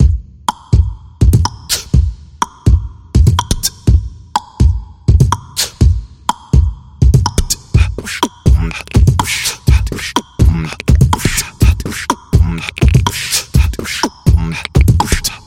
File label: Beat Box; drumming action